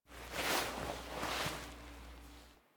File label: Foley Stand Up; Cinematics; Operator; Warframe; The War Within; Mountain Pass